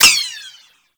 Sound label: ricochet; Warframe; Cowgirl